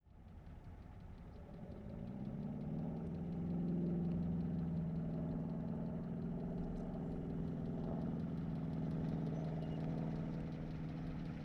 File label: 1937 Plymouth Ext Slow By; Period Vehicles